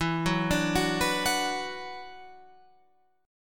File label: E 7th Suspended 2nd, down, Guitar, Open F; E7sus2; arpeggiated